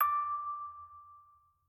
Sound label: orgel